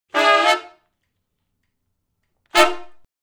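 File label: R&B Riff (F) harmony; Sections; Crimson Blue and Fabulous Horncraft 4 RnB; Horncraft for R&B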